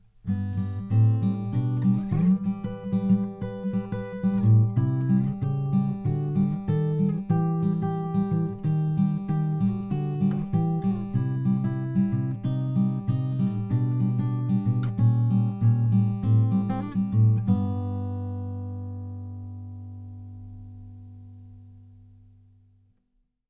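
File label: gtr ac rear, acoustic guitar; Crossroad Studio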